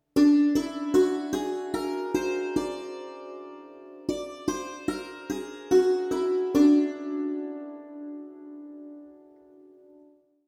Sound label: Sehera; murchanas